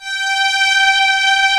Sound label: COMBO STRINGS; OMI - Universe of Sounds